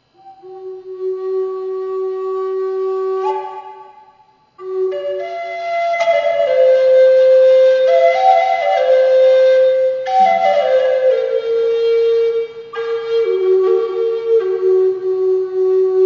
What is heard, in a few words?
a native American Flute demonstration